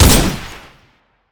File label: shoot; EMXArms; emx mg90